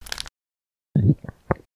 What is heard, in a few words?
Candy Eat